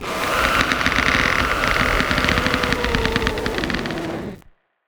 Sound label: escape